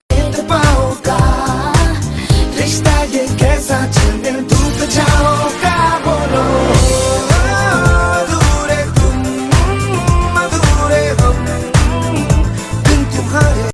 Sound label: Ringtone